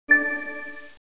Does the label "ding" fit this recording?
Yes